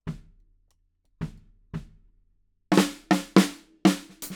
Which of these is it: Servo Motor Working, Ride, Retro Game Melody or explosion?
Ride